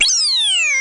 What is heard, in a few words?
world Map Change World; N64; YOSHISISLAND